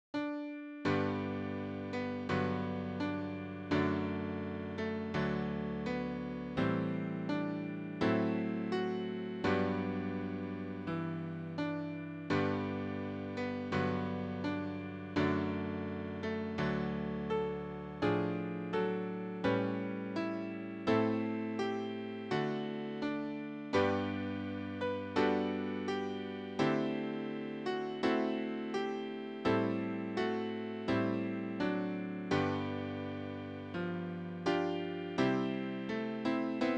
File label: easy piano; Oh Beautiful for Spacious Skies